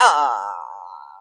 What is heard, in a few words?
"ah"